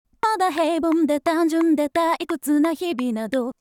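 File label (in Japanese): Synthesizer V, 調整前, 歌声; 音素タイミング; Synthesizer V Studio 2 Pro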